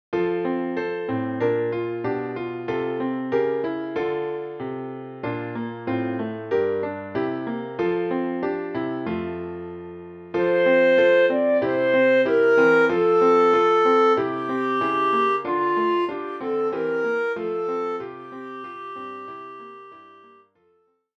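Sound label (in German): Alle Jahre wieder Bassklarinette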